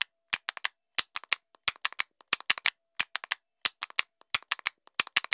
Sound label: ETHNO EXTRA 90; ethno2